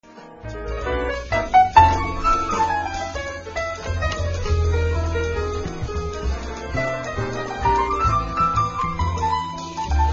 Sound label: New Waltz